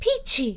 haha; peach; SM64 CO-OP

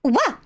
wah; birdo; SM64 CO-OP

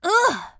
ooof; pauline; SM64 CO-OP

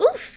ooof; toadette; SM64 CO-OP

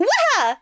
yahoo; birdo; SM64 CO-OP

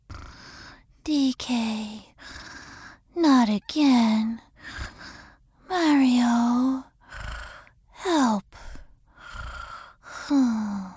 snoring; pauline; SM64 CO-OP

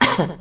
coughing; rosalina; SM64 CO-OP

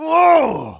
"whoa"; foreman spike; SM64 CO-OP

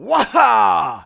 yahoo; foreman spike; SM64 CO-OP

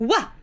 yah wah hoo; birdo; SM64 CO-OP

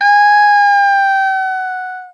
Yell; Miku; SM64 CO-OP; Triple Baka Pack

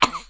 coughing; yoshi; SM64 CO-OP

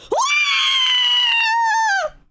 waaaooow; birdo; SM64 CO-OP

